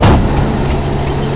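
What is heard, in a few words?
door mine start; doors elevators; Star Wars Obi-Wan; XBOX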